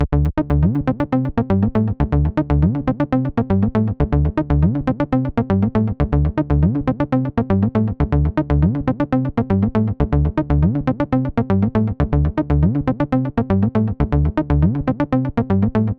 Trance

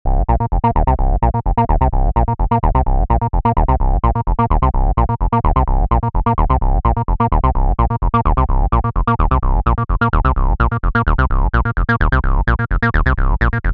Simple Bass

